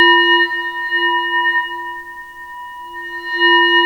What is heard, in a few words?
BUD BELL LO, 3D KEYS CHORD; 3D Sound; 3D Keys VOL-1